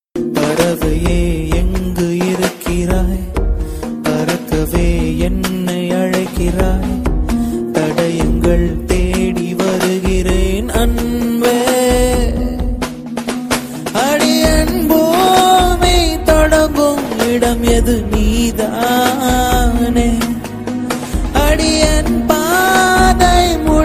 Tamil Ringtone; Family Cut Song

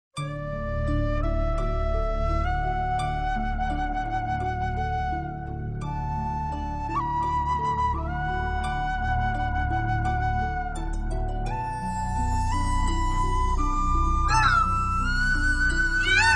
Flute Ringtone; Little Krishna

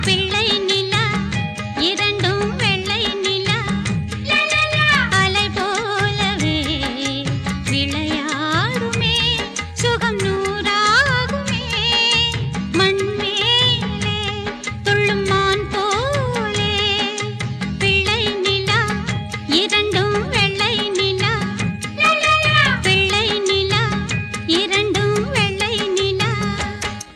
Female Ringtone

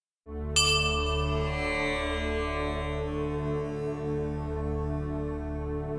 Hindu Temple Bell Ringtone

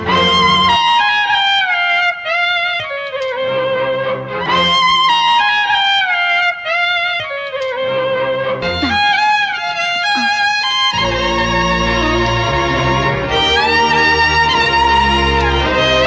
Sad Bgm Ringtone; Apoorva Sagodharargal

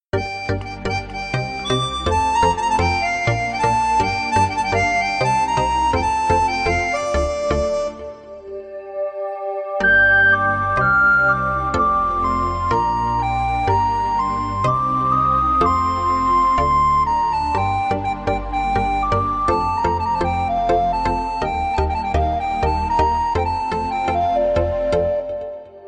Airtel Bgm Ringtone

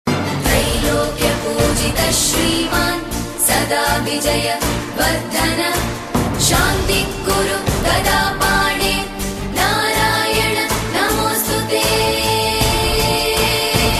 Mantra; Vishnu

